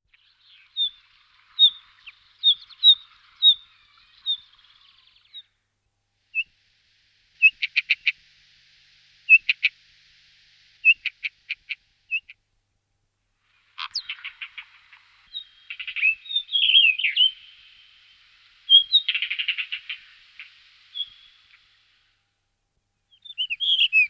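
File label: Monticola solitarius, passeriformes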